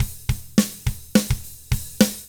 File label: POP ROCK, Double Platinum Drums; 109POP ROCK